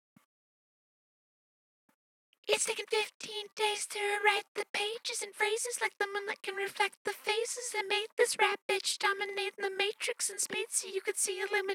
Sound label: cypher vocals 95bpm